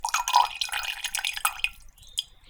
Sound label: Dribble, Water Dribble